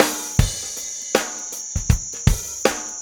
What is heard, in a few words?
noisy drums; DIGITAL SIGNAL PROCESSORS